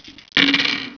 roll; yahoozie

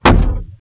met strk, bugs; Starship Troopers

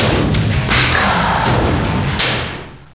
spray

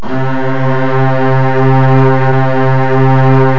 strings; Sounds Terrific II; Weird Science

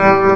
guit; Music; BLOOD2; Ancient

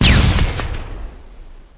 WDK Steel Ball; The War Engine

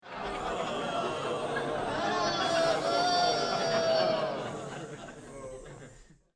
boo, sound emoticons; Gizmo Project 4.0.4

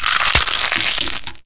CRUSHER; REDNECK; RAMPAGER

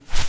WING; Messiah; The Games Machine 76

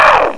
Zap; Software of the Month Club (Children's) 1997 July; Hexx II

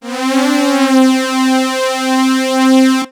lead, synths

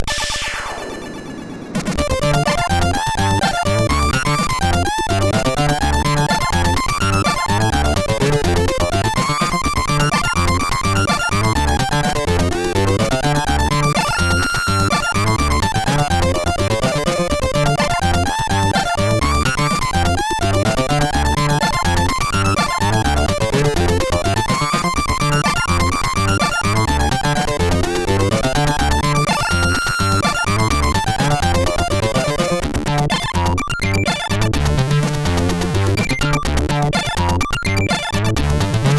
Rain Funk; SIDTunes

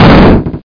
pound; World of Video; qualitytime

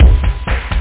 beat bdrum; rotox

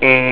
Eengh

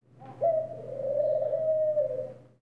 dove; ambients; PC Gamer 39

